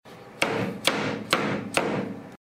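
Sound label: rubber mallet wood; rub malt wood new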